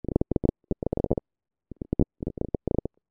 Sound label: crumpling; Continuous crumpling; The NIW Project; NIW: Natural interactive walking